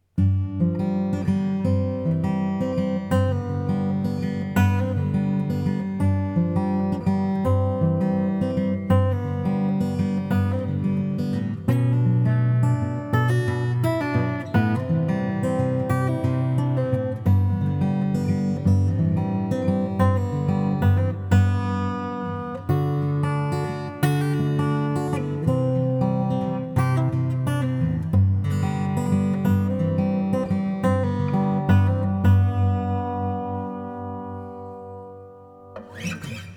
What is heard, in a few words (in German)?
Akustikgitarre Preset Musik Hall Grosser Saal; Tascam Portacapture X6